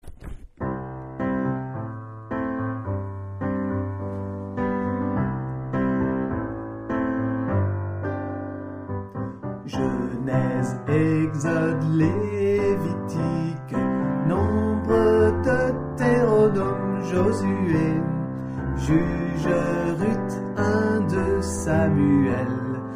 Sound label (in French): 66 livres chanté